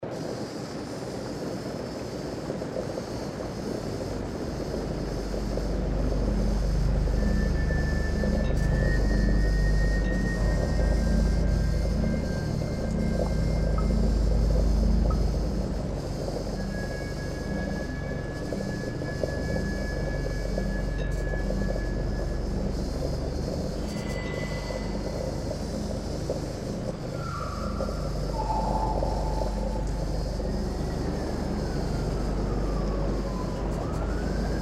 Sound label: creepy ambience